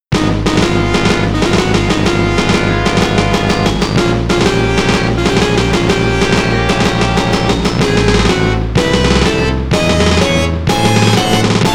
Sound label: MISSION FAILED [GAME OVER] (Arenji Baajon); Namco Sound Museum from X68000